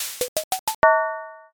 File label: transform mech